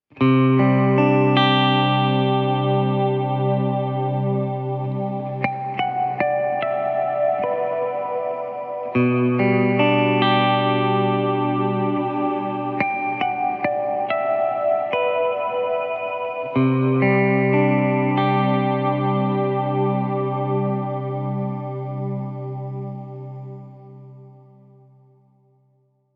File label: Dynamic